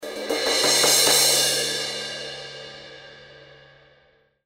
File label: K Con Crash Build Crash, cymbal